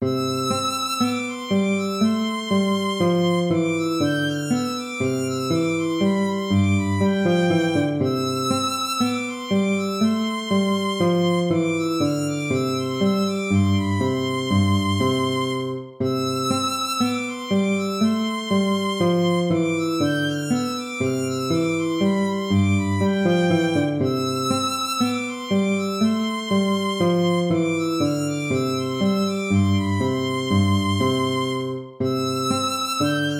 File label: allegro; hook james